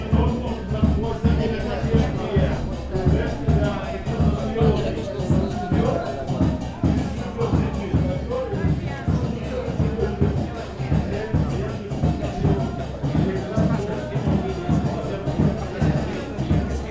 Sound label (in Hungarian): medina utcazenélés magyar csoport